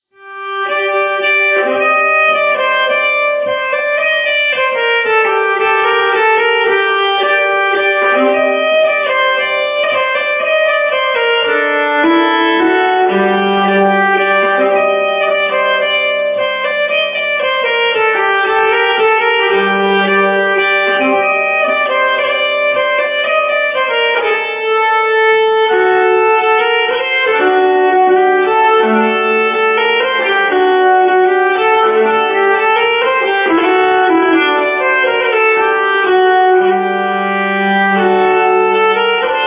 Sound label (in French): Menuett rapide, nyckelharpa